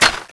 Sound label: clip out; tfa cso; hk121